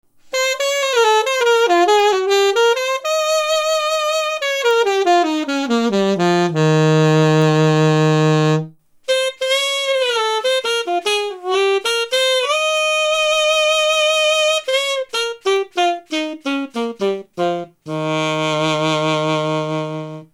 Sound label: Alto Sax Test; XPression